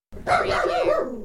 Small Dog Bark

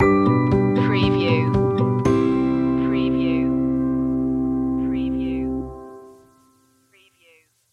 travel the distance stinger

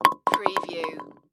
Sports Bowling Single Pin Knock Over

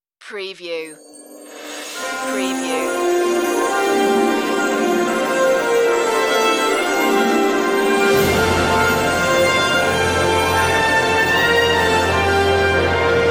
regal ending no choir

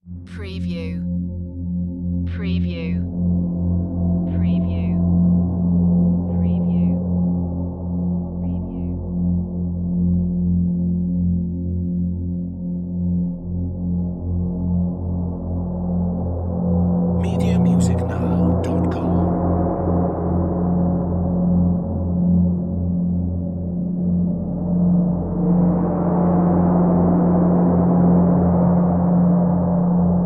Drone Synth Eerie; Sound Effects Bible

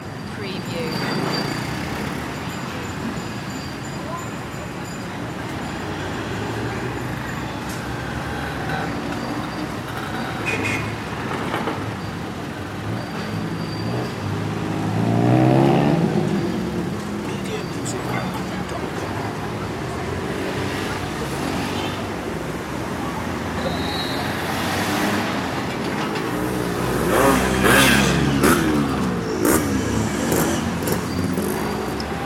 chaotic city ambience loop

